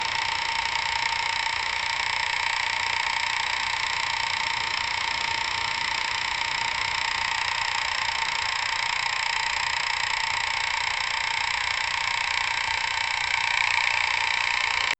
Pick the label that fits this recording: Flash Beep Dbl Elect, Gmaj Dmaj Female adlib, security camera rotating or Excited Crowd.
security camera rotating